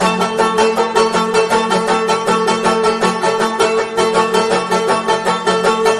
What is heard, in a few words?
banjo; music